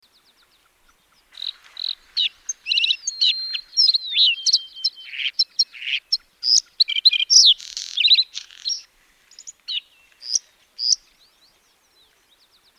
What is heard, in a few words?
bluethroat